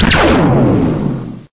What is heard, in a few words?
ray gun; blobwarsAttrition; Mike Koenig